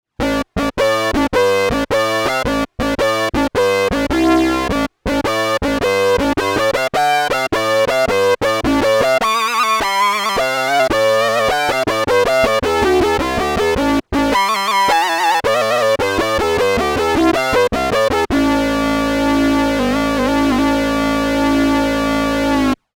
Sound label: Sync Wah